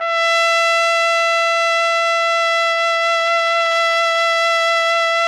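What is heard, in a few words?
trumpet; Roland - LA Composer vol. 3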